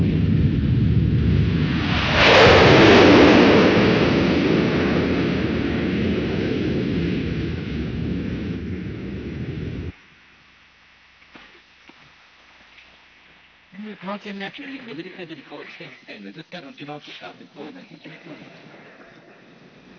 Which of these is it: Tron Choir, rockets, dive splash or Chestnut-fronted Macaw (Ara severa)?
rockets